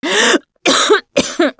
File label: Cough, russian